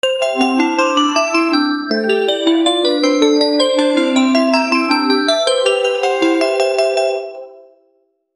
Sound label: slot game win, slot machine